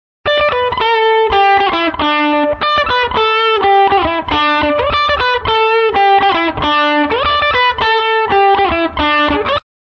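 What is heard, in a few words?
lead pattern; a repetitive pattern with lead guitar; leadptrn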